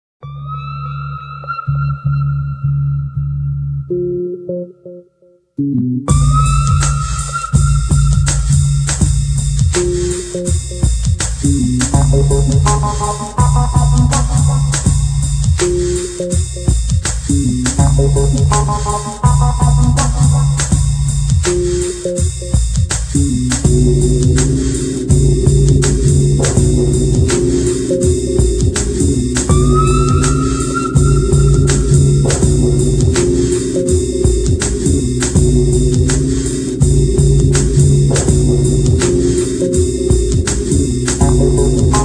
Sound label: Ambient Cities